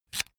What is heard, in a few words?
electric drill template adjust heavy in; soundpool